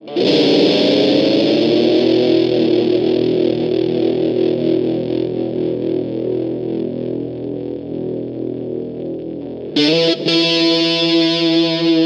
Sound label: pulse; pompeii